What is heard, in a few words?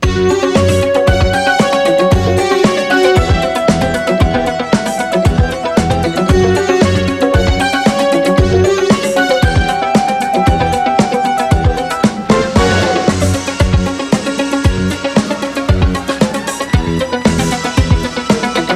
FS Music; netent